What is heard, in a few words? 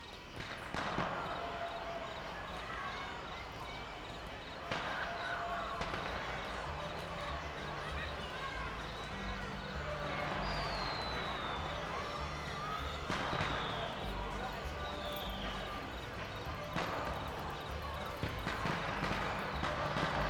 amb spirit blossom festival; lol